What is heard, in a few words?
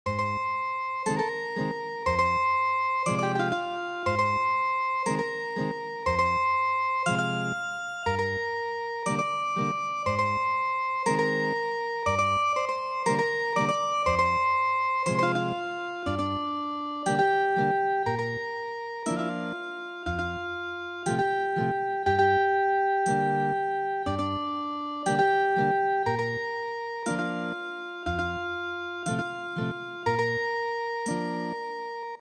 Ethnic Guitar, midi traditional; Wuzhishan ge